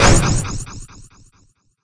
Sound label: bat lose; Geschicklichkeit; Bricks Of Egypt